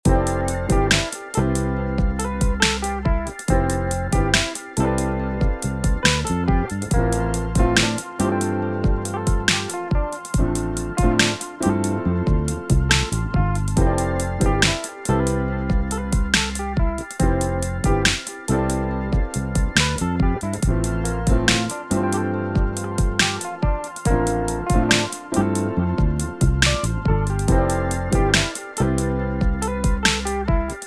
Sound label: DEMO SONG RNB Soul